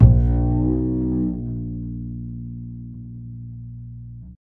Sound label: GLISS END, DB TRICKS; Univers Sons; Jazzistic